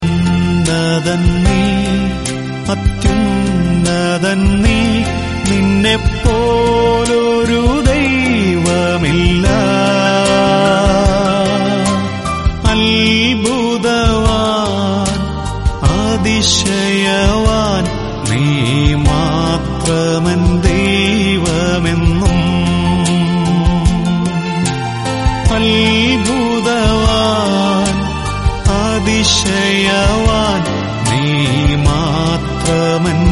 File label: Malayalam Christian Devotional